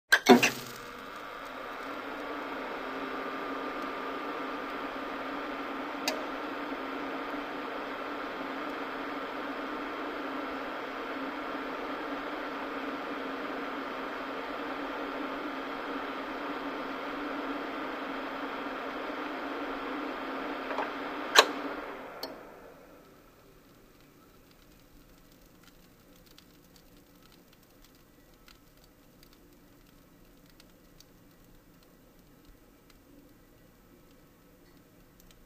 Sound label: Turning on the computer, fan noise, shutting down